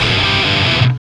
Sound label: GTR; Roland - LA Composer vol. 2